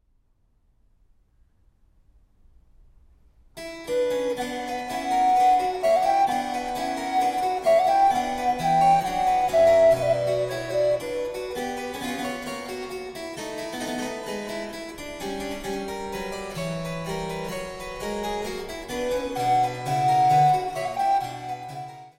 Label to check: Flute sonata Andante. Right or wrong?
Right